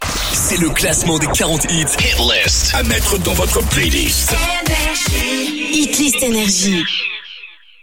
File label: Jingle; NRJ